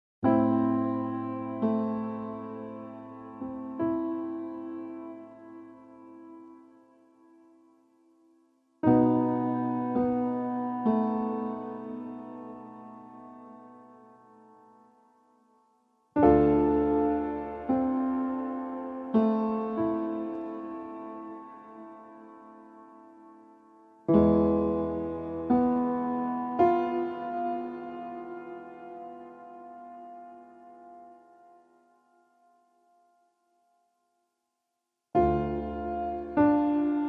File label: Piano Only